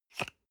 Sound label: toothpaste screw cup open medium single